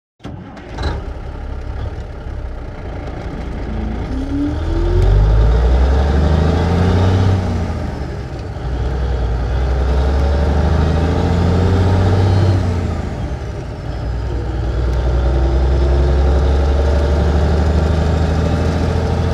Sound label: 1954 Big Rig Int Start Steady Slow Idle Off, Period Vehicles